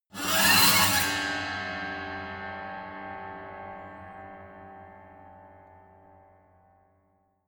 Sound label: piano bow string broken high